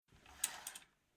Domestic Window Curtains Wooden Rail Open Slightly; Miscellaneous Impact